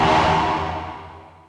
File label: gall, Misc Percussion Ticks